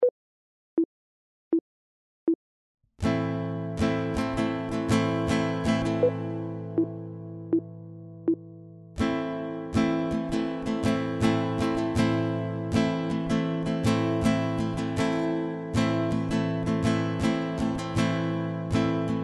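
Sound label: chord, guitar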